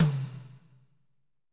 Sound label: tom, Pop Country, Wet; Northstar; Drumscapes Roland